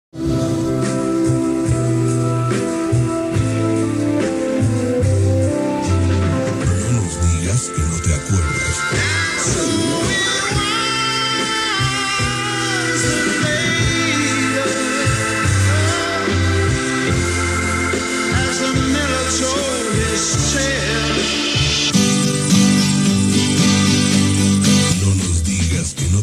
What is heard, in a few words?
Classic pop, classic rock; radio; 1991 WFM